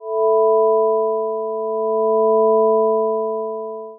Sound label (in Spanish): alarm pulso digital